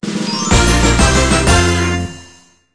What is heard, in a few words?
Raid UI Result Success Jingle